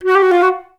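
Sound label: flutes F#3; Best Service; Peter Siedlaczek's Advanced Orchestra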